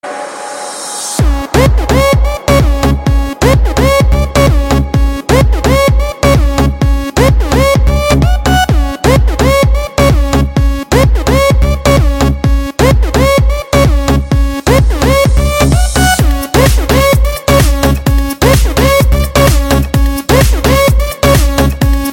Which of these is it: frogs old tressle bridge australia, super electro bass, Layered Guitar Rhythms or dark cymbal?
super electro bass